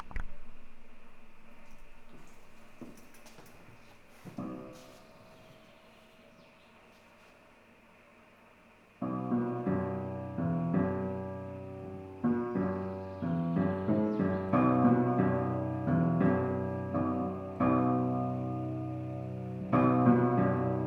WARMING UP, Instrumental; SeroNoise

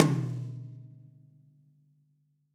Tom 90s Heavy Rock; Drums

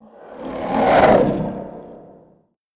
Guardian Cameo By; Cave; Journey

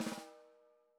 Snare Roll; Ableton Live 12 Lite Core Library

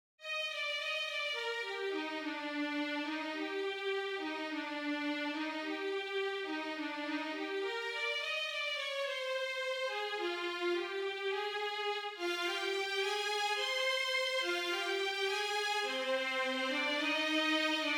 Full Strings Melody; Sony; ACID Pro 7.0

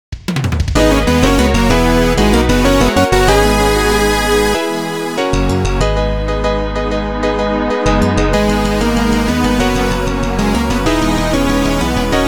bgm victory drumless; ZeroRanger

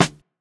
Snare Shuffle, drums

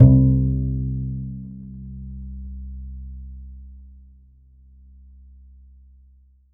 Bass Upright C1

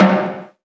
Stick Vinyl; Drums; Misc Percussion

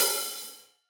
Hihat Open Side

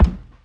Kick; Ableton Live 12 Lite; Core Library; Drums

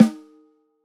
Snare Low Stick Off Center, drums

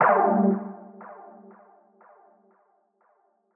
Stab Synth Bend; One Shots; Ableton Live 12 Lite; Core Library

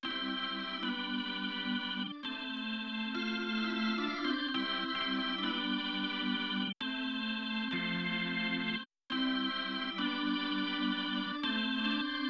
organ; Pro Tracks

